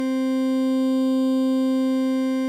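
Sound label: ambience workshop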